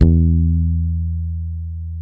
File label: SADWSKY MUTE, Bass